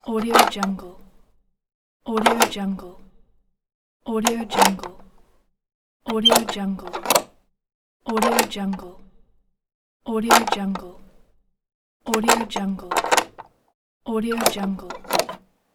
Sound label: latch